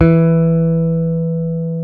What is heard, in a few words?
P BASS E4, pick bass